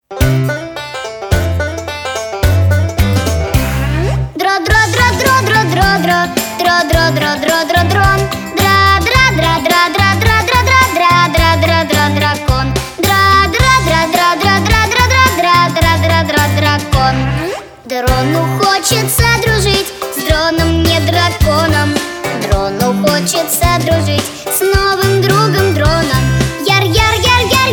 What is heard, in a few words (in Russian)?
pesnya; fiksiki; russian